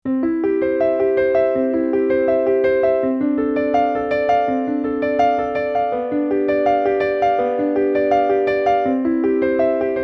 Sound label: Prelude C Werckmeister, temperament